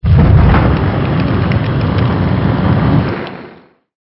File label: burning